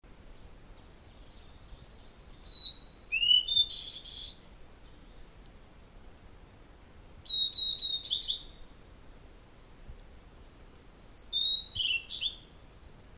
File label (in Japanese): ooruri